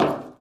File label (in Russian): neozhidannij tyazhyolij silnij udar po derevu